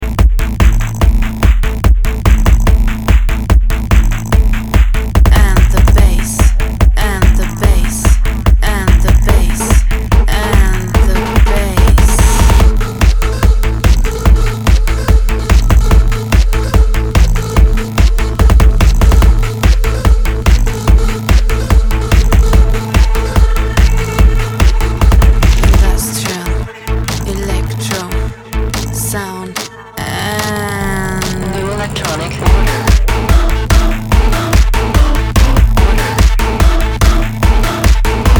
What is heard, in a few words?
industrial